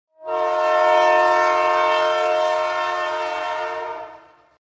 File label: train horn sound